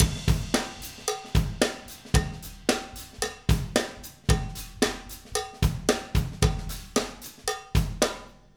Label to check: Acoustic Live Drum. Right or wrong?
Right